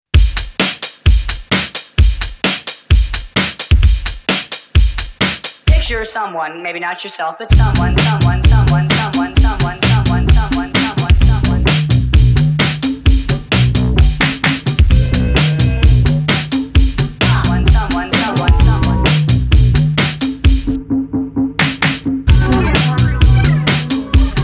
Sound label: instrumental